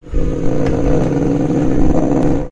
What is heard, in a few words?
dog growl; soundbible